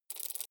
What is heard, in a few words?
medieval slider move loop